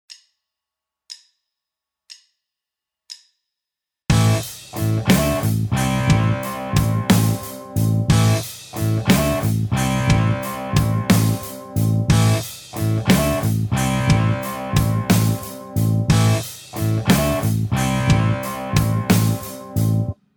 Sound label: Rockschool backing; Guitar Techniques 2019 Issue 296 June